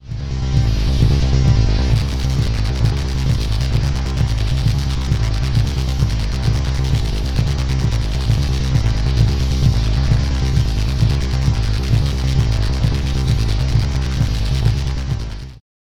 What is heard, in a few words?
Round Fuzz Irregular; Elektron Analog Heat